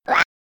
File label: fail